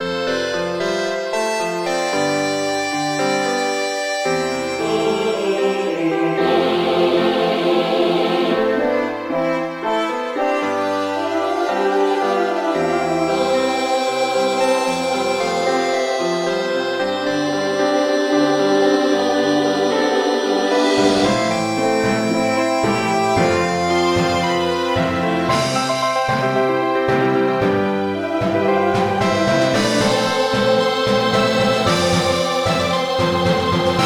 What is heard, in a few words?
bgm